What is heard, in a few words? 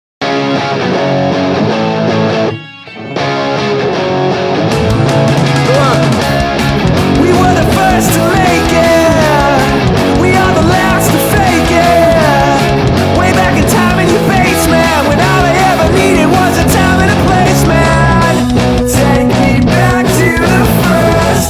Punk (New Vocal)